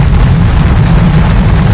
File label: rumble; nature; hl2